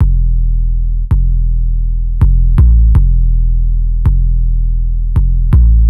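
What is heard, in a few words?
Sub Attack 808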